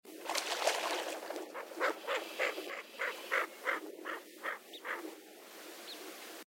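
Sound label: greater scaup voice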